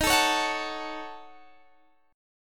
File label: D sharp Minor 7th Flat 5th D sharp down Guitar Open D